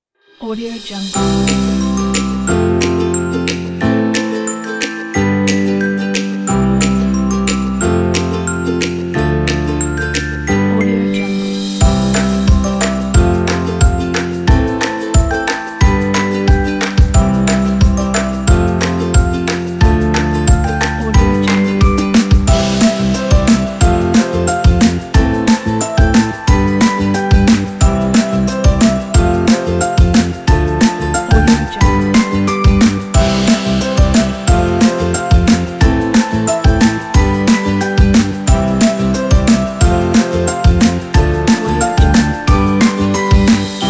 sunny mood

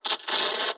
note book paper tear

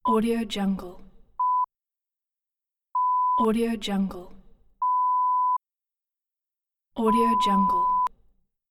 censor bleep tone

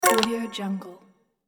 unlock achievement

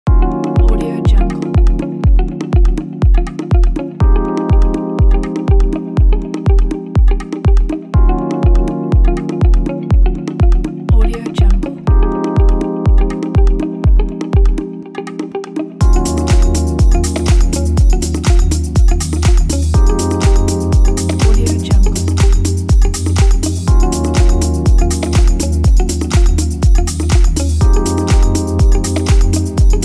fashion lounge

